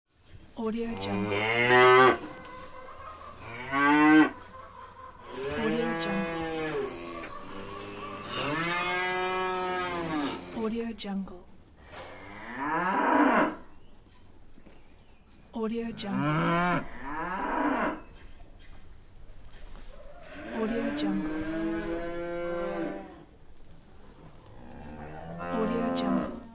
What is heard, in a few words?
mooing of several cows; audio-tree